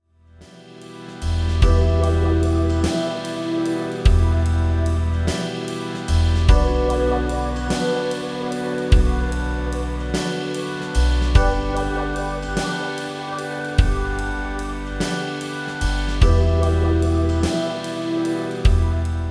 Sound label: blues